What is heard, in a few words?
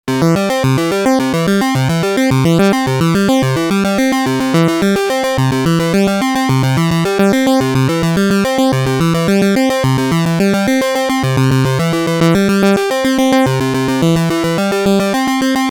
ARP Repeat; Europa